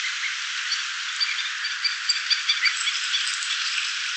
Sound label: Himantopus mexicanus; ecologia paisaje sonoro